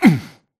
grunt hits; Titanfall 2

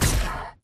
Jumpjet Jet Start Chuff; Titanfall 2

